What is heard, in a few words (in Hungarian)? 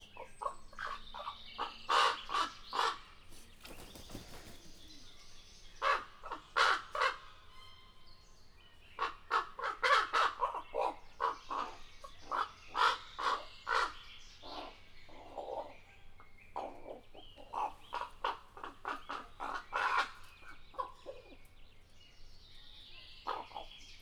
helyi nyelvjárás, holló; miskolc zoo